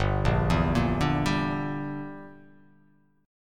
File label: G Minor 6th; Gm6 arpeggiated; JGuitar